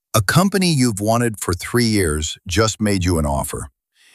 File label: briefing beat; lowball offer